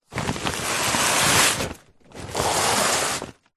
large green waste garden bag full plants foliage slide ground; household; recorded at bag; zapsplat